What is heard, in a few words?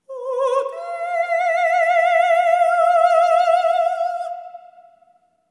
SOP. TRAD, Symphony of Voices; Spectrasonics